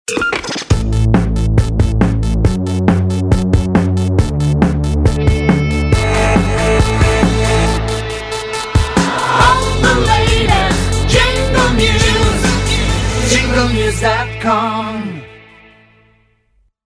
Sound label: jingles ramp